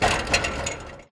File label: metal gate fence impact